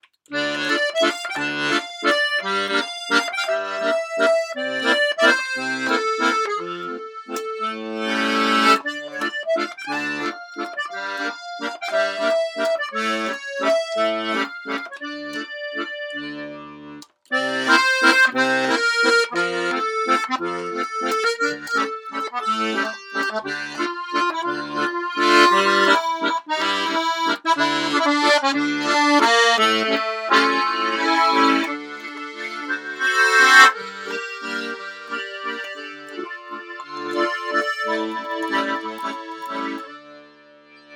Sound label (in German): SOPRANI, akkordeon; lucciola